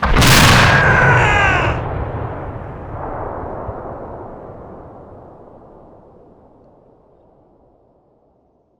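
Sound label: infantry death; SuperPower 2 Redux V7A; unit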